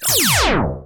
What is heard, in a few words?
zap; 3DO; Portfolio 2.5; Jumpstart; UFO